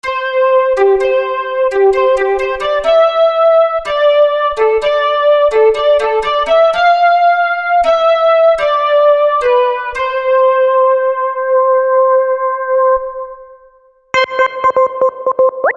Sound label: flute